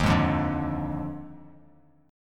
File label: D sharp Major 7th Suspended 2nd Suspended 4th, down, Bass Standard; strummed; JGuitar